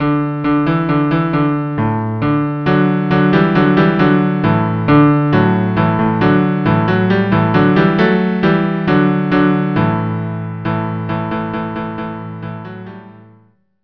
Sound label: cello cello